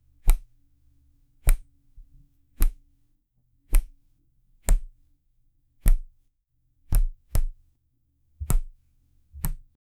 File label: a high quality foley soun, card games